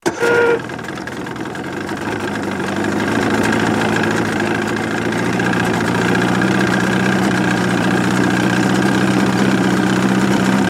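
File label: 115 Hp Evinrude Start Loop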